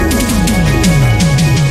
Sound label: fill